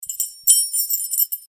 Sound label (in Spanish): Tintineo; Banco de Sonidos - Facultad de Art